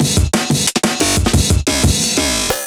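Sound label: Stretch drum and bass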